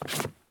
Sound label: stop wood; Escape from Tarkov